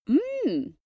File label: female impressed; koerpergeraeusche; menschen; soundpool